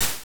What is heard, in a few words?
Hat; Ristar; M8; Genesis